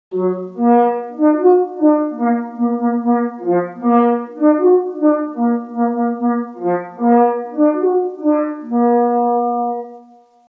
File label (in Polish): naganka cala szybciej, sygnaly